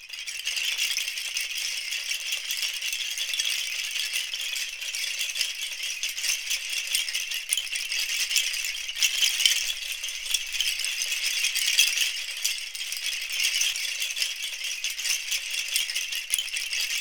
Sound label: BAMBOO, Percussion